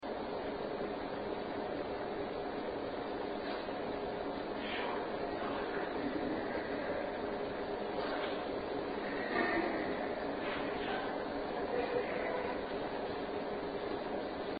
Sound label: Spooky Laughter